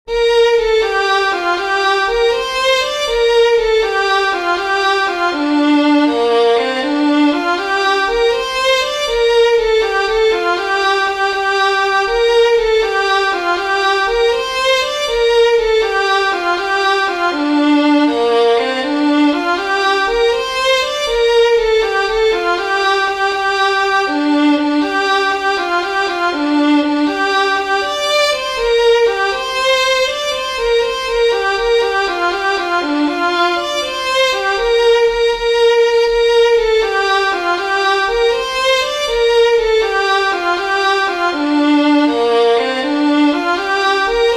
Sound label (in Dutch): Volkslied Nepal viool